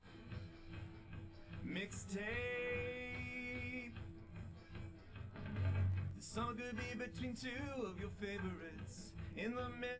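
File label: wdrums live recording 250ms 5cm, underdetermined; SiSEC11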